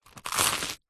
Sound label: human eat bite single into crispy traditional french baguette; zapsplat